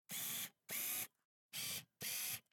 Camera, Pentax KS2, DSLR, electronic, mechanical, focus, steady, out in, XY; Eiravaein Works; Vaeyan IV